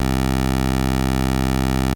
8 Bit Square Wave

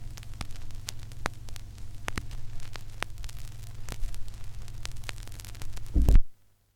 Turntable End

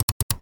Mouse Double Click

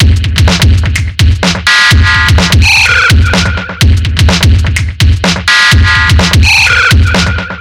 Abstract Electrofunkbreakbeats 126bpm; Echottanta

